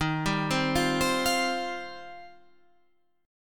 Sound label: Eb 6th Add 9th, Guitar, Half Step Down